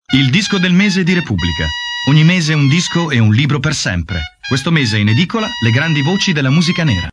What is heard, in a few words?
disco; DISCO DEL / MESE DI REPUBBLICA (TV)